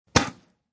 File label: Spit, Quick spit; Spitting Sound Effects; Sound Effects:Human